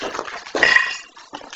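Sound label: unknown noise and no response; School House in Michigan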